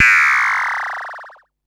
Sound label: Perc Synth Raw